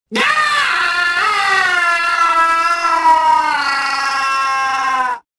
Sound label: falling, rodian